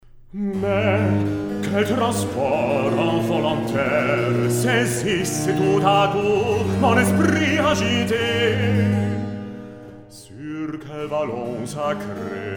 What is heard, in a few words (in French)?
Recitatif; Bacchus